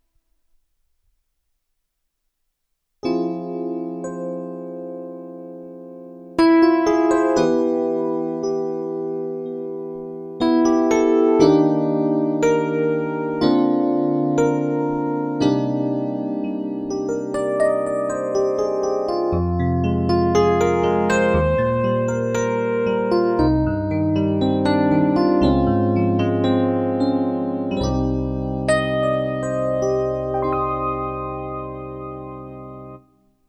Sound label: Kawai CN14 E Piano